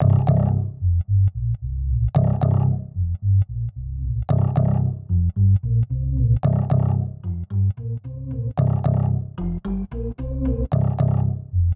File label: BASS; Memphis